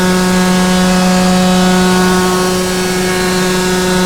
KART IN ON HIGH, kart

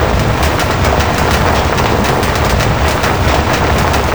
grass skid in, Kart Tires; AOS RF1 Kart Demo

